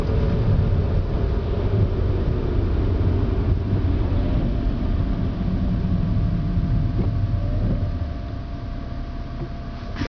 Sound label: Klock; MB-Forum; CLC350; Mängel